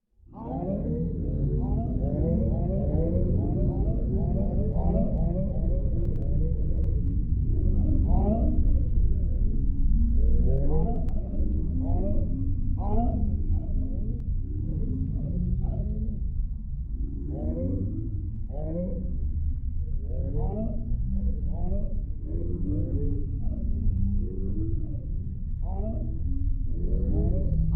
Created Ambience Submerged Bovines, Artificial Sound Effects